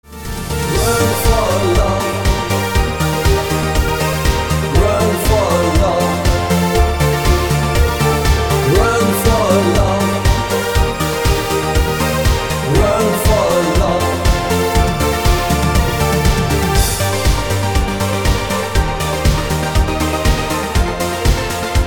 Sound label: vocal radio remix